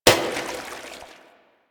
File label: Water Balloon Impact; Warframe; Water Balloons